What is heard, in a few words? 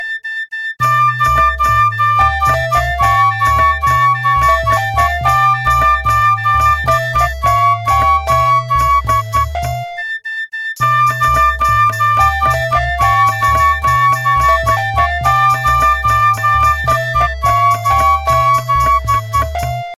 Mystic Flute